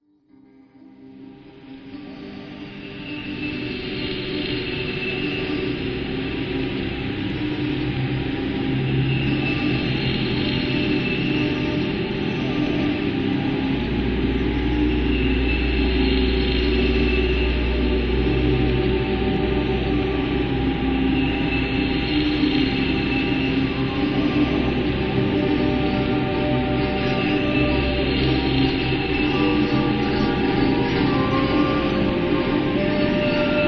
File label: folk 4AMB